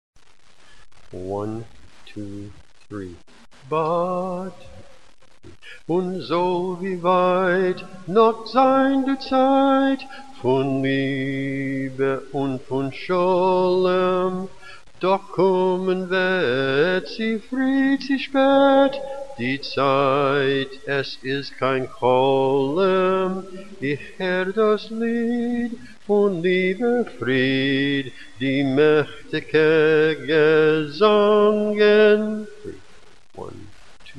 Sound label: Bass